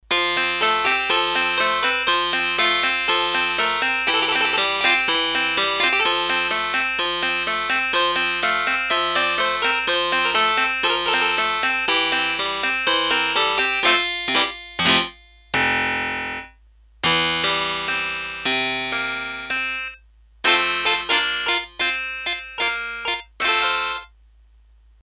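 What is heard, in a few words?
Harpsichord, Korg DS-8; Factory Patches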